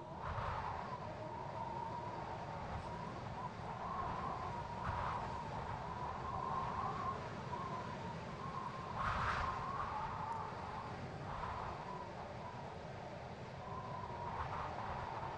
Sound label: snow wind; ext; Titanfall 2